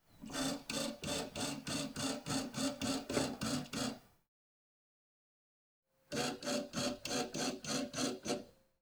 Non Contact Tonometer, Pneumatic, Vertical, Adjustment, Up, Down - Doing vertical adjustment; Optometrist Equipment; Doing vertical adjustment all the way; SHhh! Listen SFX Libraries